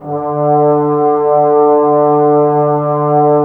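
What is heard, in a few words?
brass trombones D, Woodwind Brass Ensemble; 90 s Sample CDs; Miroslav Vitous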